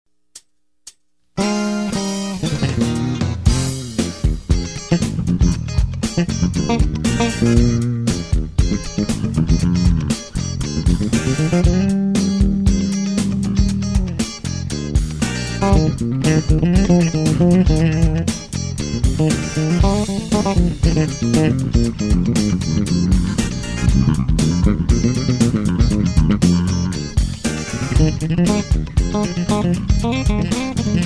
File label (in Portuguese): funk solo